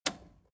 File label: record player repeat push